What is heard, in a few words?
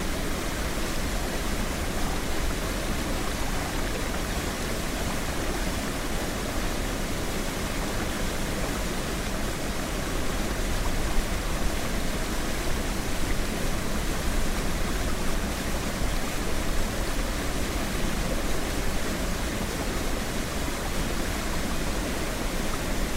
my waterfall